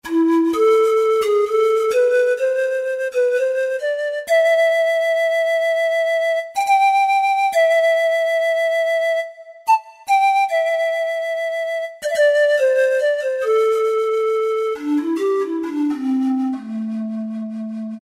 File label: Pan Flute, Yamaha PSR-3000; Factory Voices; Flute & Woodwind